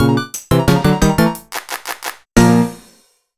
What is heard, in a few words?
Level Clear! (5th Floor Fanfare); mario vs. donkey kong 2 march of the minis; mario vs. donkey kong 2 minimini daikoushin; nintendo ds